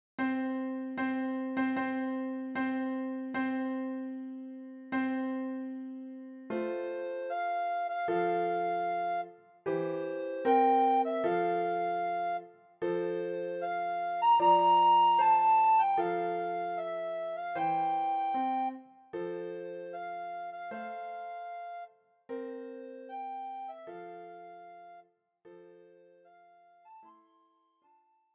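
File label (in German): Hochzeitsmarsch, Sopranblockflöte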